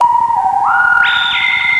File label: Scary